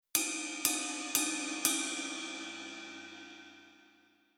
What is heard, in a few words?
Rhythm Drum Set Basic Ride